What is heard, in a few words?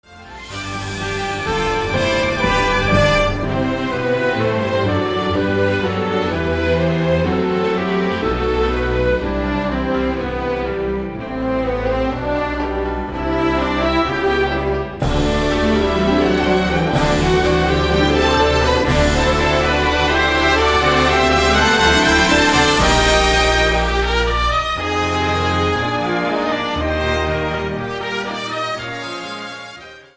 overture